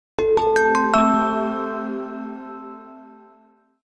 Message, Nokia Alerts; Windows Phone apps

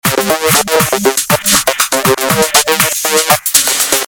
Squash tone, phonetones; Samsung; GT-S6700C

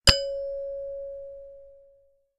Email, Nokia Alerts; Windows Phone apps

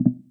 Keypress Invalid; Nextbit; Robin

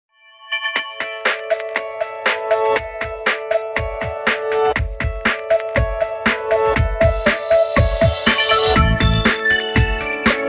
Shuttle, ringtones; Bluboo; S8

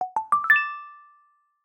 decorative celebration; Google; Material Design sounds; Hero Sounds

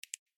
Pure, notifications

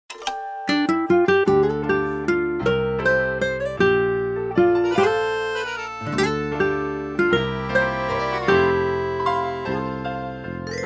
Waltz, ringtone; Coolpad; ivvi-K1-mini

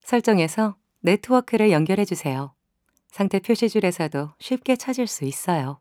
connect network; Korean; Samsung; Galaxy S8; Bixby

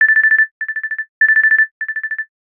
Cricket, Ringtones

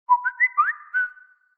Whistle; Samsung Galaxy-S2-Skyrocket-SGH-i727

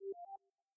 Charging Started; Sharp; AQUOS sense10 SH-M33

